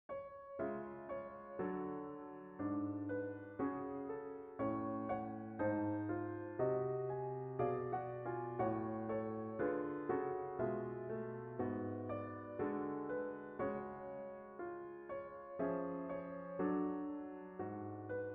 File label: In this example the Eulenspiegel chord is used on V in a minor key